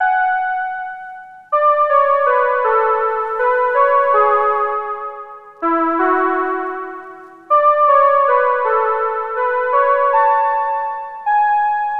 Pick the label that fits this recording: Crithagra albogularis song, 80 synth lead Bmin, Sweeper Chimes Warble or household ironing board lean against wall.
80 synth lead Bmin